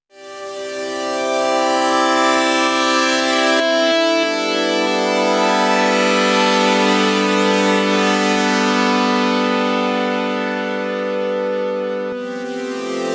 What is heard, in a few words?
Moog Mariana 4 Voice Polymoog